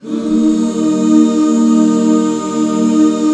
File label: EB MAJ, Back Chords; Sound & Vision - Gigapack II CD 1